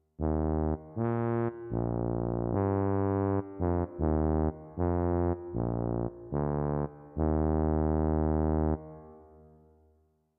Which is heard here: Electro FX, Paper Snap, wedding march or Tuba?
Tuba